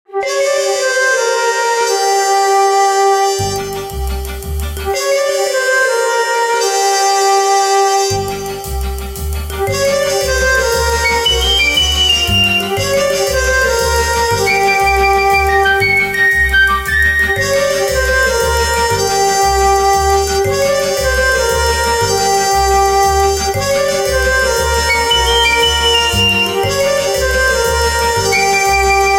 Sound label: GuitarMusic